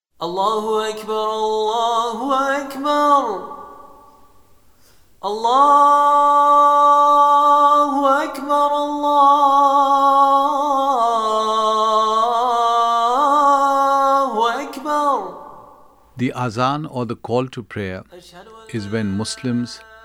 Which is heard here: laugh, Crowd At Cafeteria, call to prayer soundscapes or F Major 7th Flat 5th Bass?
call to prayer soundscapes